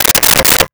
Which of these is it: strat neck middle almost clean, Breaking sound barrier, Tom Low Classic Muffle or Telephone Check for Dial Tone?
Telephone Check for Dial Tone